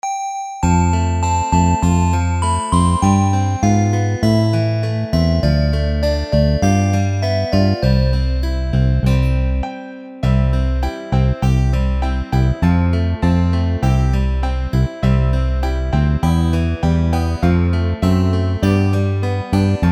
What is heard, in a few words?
Karaoke